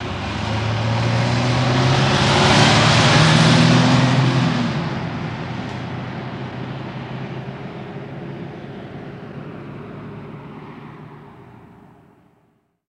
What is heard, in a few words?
Cadillac In Gear and Away Medium